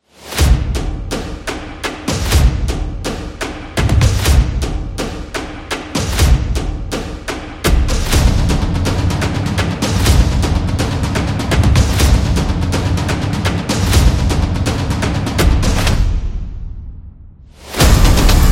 The Soccer Music, Football percussion background music inspired by samba rhythm, stadium energy